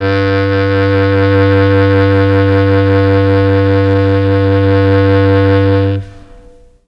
G1 clarinet, mellotron

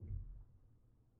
Pedal On, Upright Piano, Knight; Chordophones

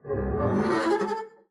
arco noise moan pig short, double bass; dsmolken; noises

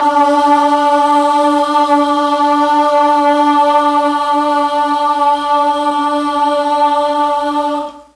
"D2" boys choir, mellotron

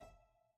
Harpsichord, French, Releases, E4; Chordophones

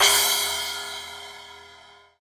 Splash-Med, hydrogen drum samples

